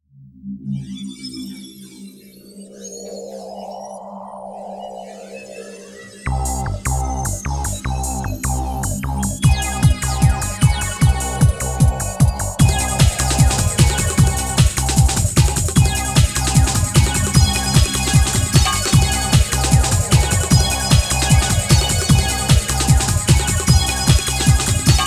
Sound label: Tentative; Slipstream Volume One 2012